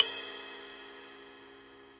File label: CRASH 16 side stick atmo, Cymbal; Ableton; Factory Packs; Drum Booth